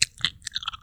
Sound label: gummy chew; dfcaverns; farming